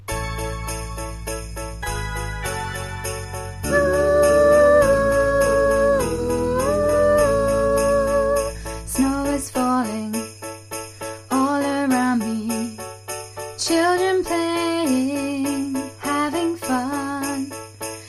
soprano top part, choir

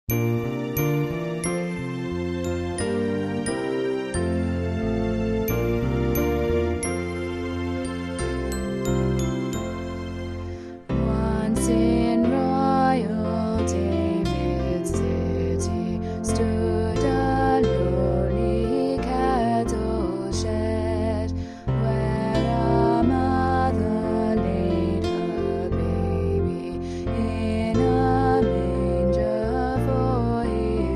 tenor

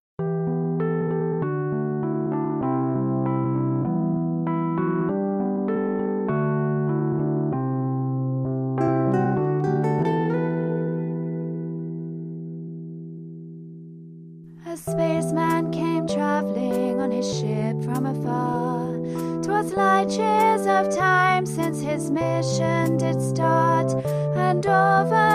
tenor, choir

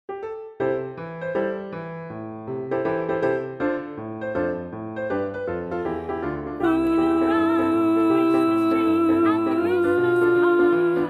rehearsal track voice

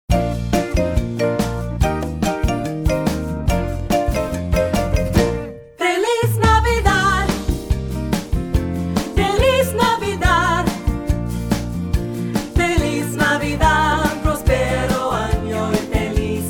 Tenor, choir

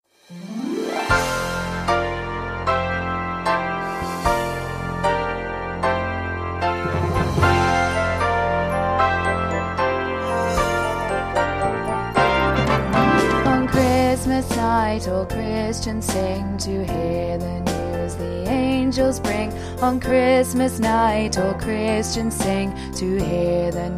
tenor

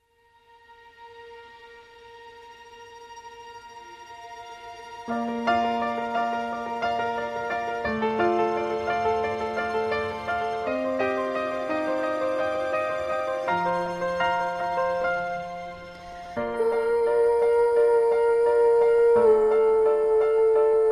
soprano top part

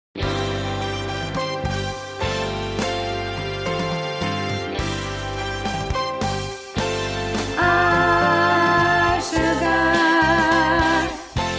alto

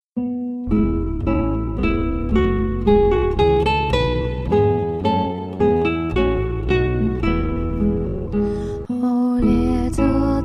alto

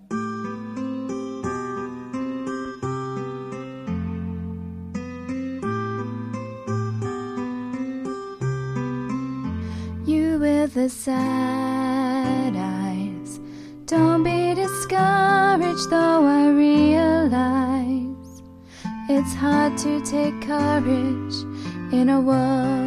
tenor bottom part

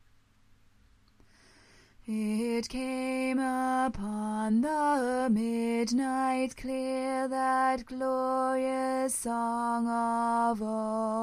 It Came Upon The Midnight Clear tenor, choir

